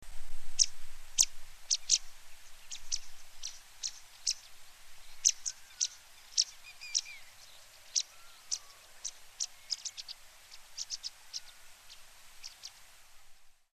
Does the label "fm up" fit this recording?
No